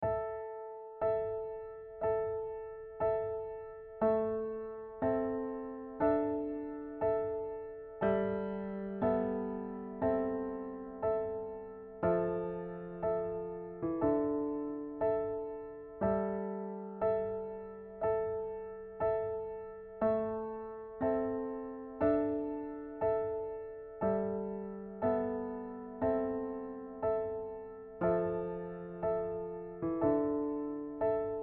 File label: keys at top; choral resources